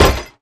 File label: Hit Closed; Thanatos